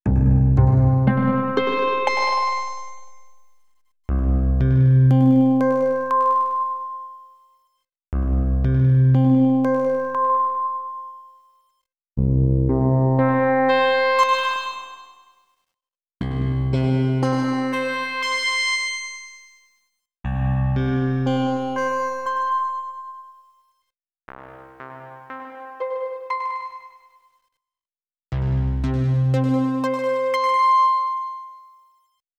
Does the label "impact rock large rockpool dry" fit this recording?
No